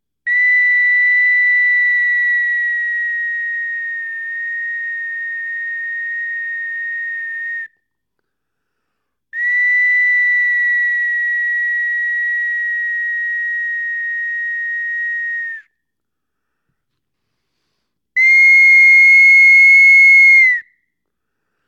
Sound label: solo